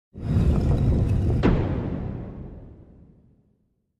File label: Sliding Metal doors close